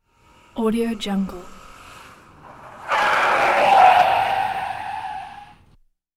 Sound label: car approach and skid